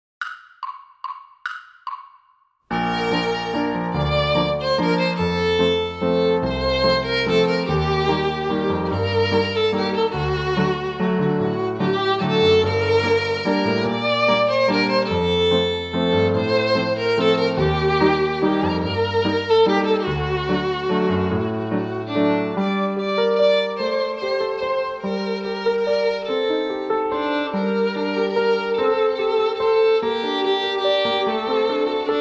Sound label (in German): Walzer in B